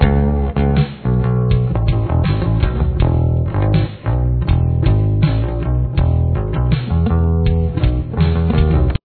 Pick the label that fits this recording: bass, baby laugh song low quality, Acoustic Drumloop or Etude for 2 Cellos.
bass